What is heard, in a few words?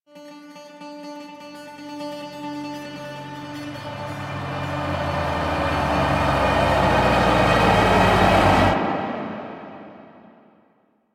Void Twin Jumpscare Cue; Warframe; NineteenNinetyNine; Stage3RideOrDie